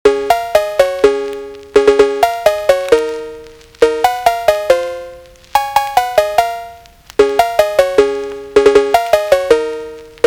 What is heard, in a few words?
phonk merry christmas jingle bells phonk remix